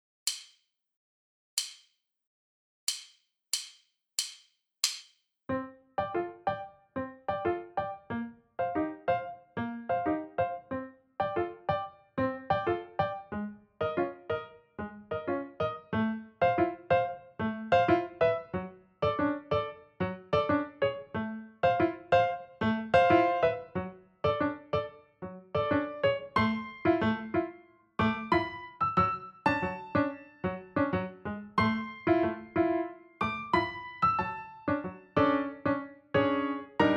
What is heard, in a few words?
piano